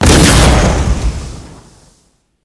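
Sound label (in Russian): разрушительный взрыв горной породы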